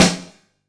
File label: nice snare drum sound f key